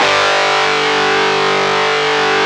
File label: GTR FUZZ, Metal; Power Chds; Club-50 - Foundations